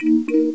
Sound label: pop